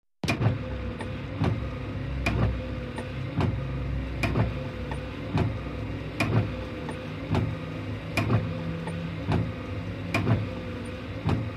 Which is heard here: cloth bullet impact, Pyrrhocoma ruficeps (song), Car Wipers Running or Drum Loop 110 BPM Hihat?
Car Wipers Running